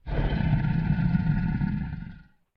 Gold Dragon menacing; SyrinScape; Vault of Dragons

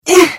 Hit; The Elder Scrolls III - Morrowind

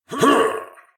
Combat; Eshimal; SyrinScape; Snarl and Oraculum

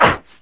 roar; The Elder Scrolls III - Morrowind

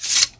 spear out; The Elder Scrolls III - Morrowind; Morrowind GOTY GOG

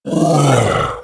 roar; The Elder Scrolls III - Morrowind

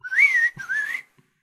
Whistler bird; SyrinScape; Syrinscape unplugged; Jungleplanet dinolaser battle

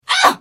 Hit; The Elder Scrolls III - Morrowind

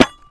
blunt, item; The Elder Scrolls III - Morrowind; Morrowind GOTY GOG

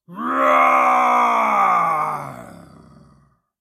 Dino roars, Jungleplanet dinolaser battle; SyrinScape; Syrinscape unplugged

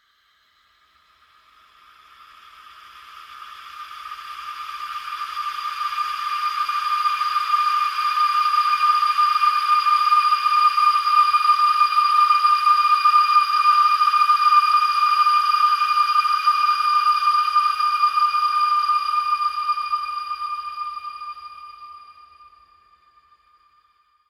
Ghost; SyrinScape; Clockwork entities

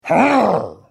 Hit

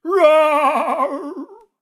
dino roar

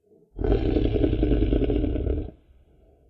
growl, Shadow giant grows and grumbles; Hound of Tindalos; SyrinScape; Iris hill manor

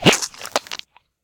Ooze Attack; SyrinScape; East Ward

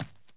run, dirt FOOT; The Elder Scrolls III - Morrowind

